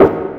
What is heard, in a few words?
step, sfx; rust